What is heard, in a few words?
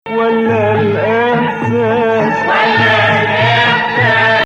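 Nawa Hijazkar, Arabic music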